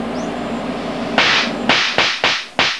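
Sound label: capacitor sparks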